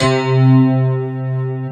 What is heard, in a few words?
FM ELP C2, Keyboards Old School; USB Soundscan vol.09; AKAI; FM ELP 5